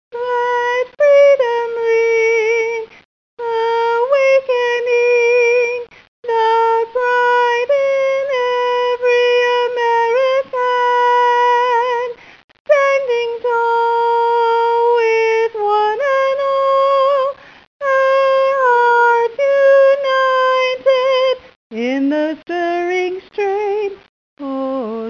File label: tenor alone, chorus